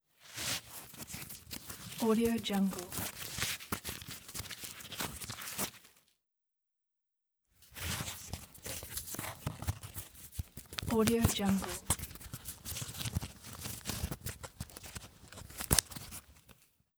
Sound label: sports shoes laces pulling and tying up